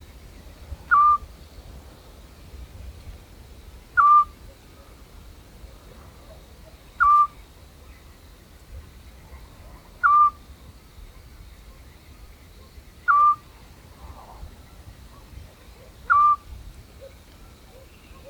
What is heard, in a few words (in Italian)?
canto assiolo